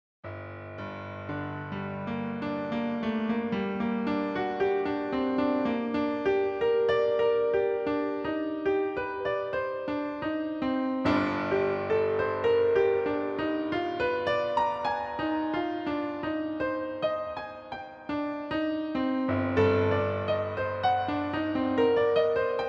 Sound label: Piano Concerto; No 2 Op 22 1st Movement; Saint Saens